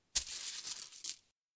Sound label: coin hand grab